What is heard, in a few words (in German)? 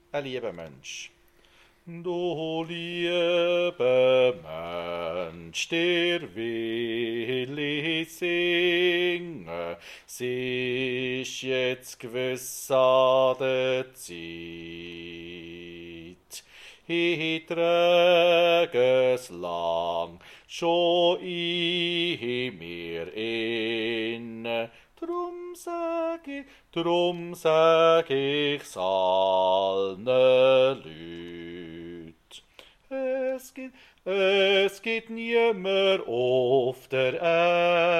2. Bass